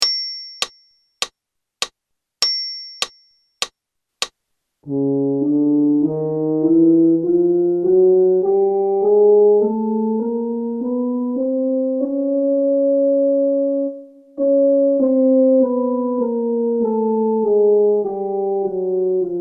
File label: Chromatic in Eb count in, brass, practice